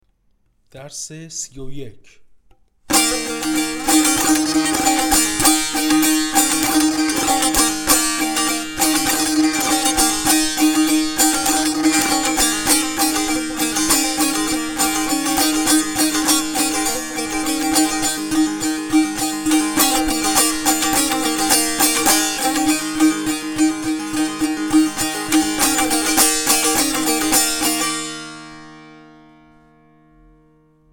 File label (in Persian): مشق تنبور; تنبور جلد دوم